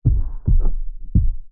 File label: household movement thud low frequency as if next door upstairs or through wall